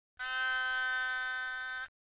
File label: haegeum; gugak